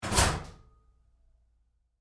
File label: Door Locker Close Roomy Lite, Metal Door; Doors